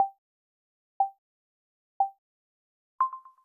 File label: call recording countdown; iOS 27